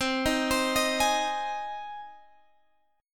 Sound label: C Minor Sharp 5th C Guitar Open G